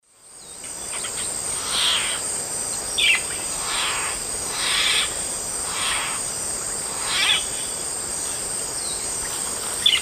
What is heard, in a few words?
Gymnomystax mexicanus, song and calls; S. America; Eastern Lowlands